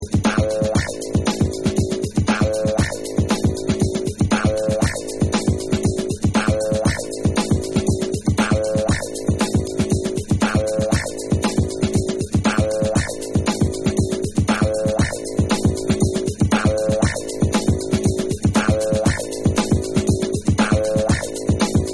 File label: Beats